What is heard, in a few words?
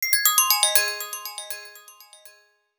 Light E-mail; Sony Ericsson; Xperia X1; PlusFourSix; Venus; Lars Edvall